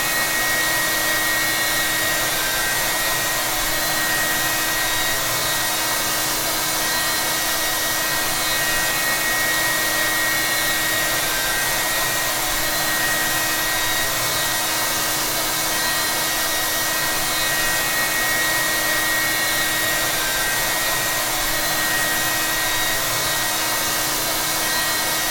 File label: women hair dryer; electric